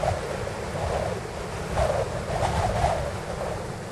Wind Gusty Loop; weather fx; Silent Hill Downpour

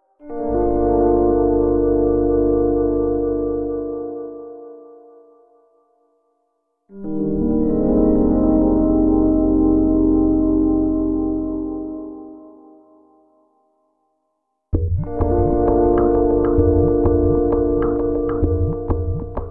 Pulsating Ambience

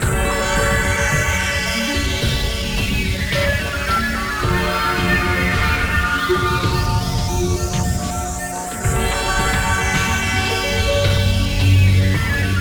Rail Car Background; Silent Hill Downpour

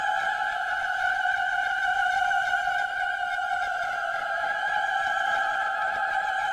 Solo Vox Loop; Silent Hill Downpour

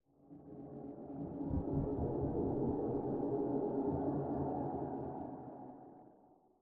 Ambient Frightener Loop; horror and atmosphere fx; Silent Hill Downpour

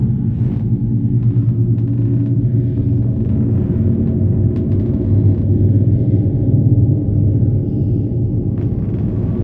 Drone Loop; horror and atmosphere fx; Silent Hill Downpour

